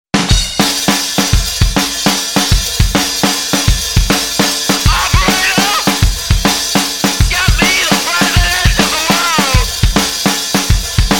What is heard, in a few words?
vox